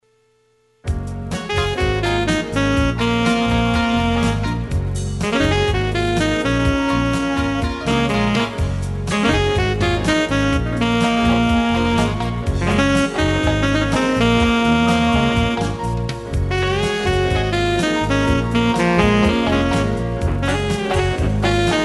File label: ballad